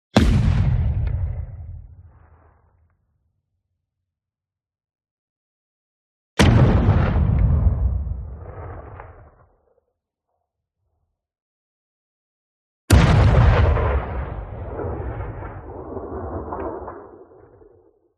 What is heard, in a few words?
ARTILLERY Light Distant; WEAPONS; smartsound